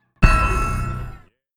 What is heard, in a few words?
ping; motherbox